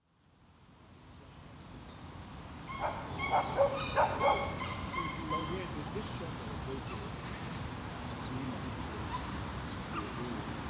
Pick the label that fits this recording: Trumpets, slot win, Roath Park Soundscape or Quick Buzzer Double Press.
Roath Park Soundscape